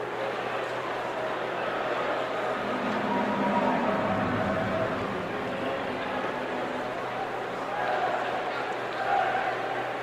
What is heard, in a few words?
arena ambiance loop; Pix the Cat